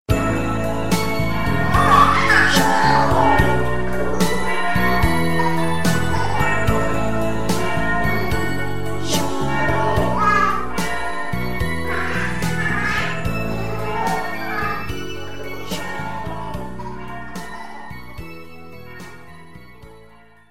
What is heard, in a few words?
Baby Cheering Tone